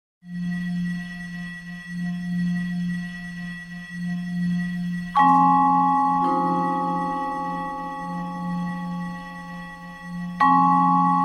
Crystalline Sounds for Bells Gongs and Resonant Percussion; Hearts of Space